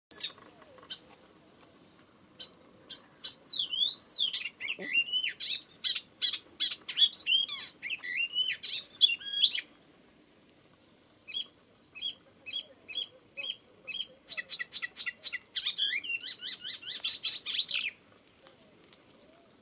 Spotvogel Hippolais icterina

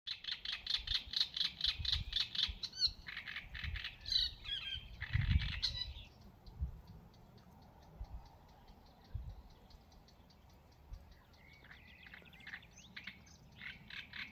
Grote Karekiet Acrocephalus arundinaceus

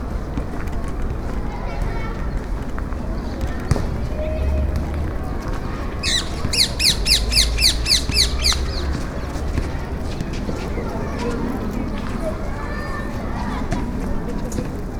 Halsbandparkiet Psittacula krameri

